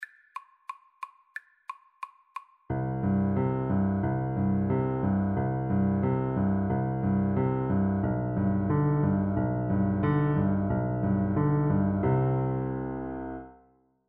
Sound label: rain rain, Piano Duet